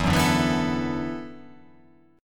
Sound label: E 7th Suspended 2nd Suspended 4th, down